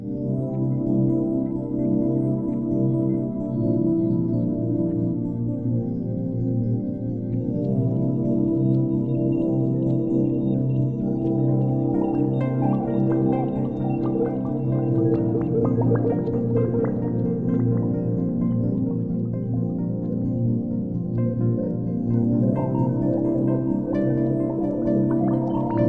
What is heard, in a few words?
ocean